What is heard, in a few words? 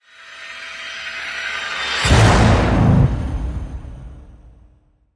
Hit Orch Release The Beast A